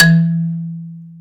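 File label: XYLO E 3, percussion; AKAI S-Series CD-ROM Sound Library VOL-8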